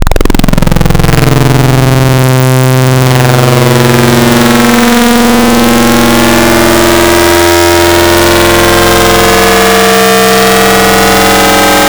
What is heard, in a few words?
Best Saw Ever, FM Wavetables; Cymatics; FM Wavetables Vol 3; Serum